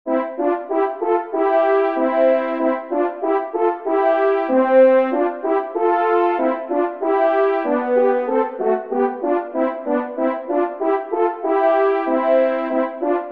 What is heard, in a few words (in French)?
DUO original Si-b Maj; Kling; duo cors n 21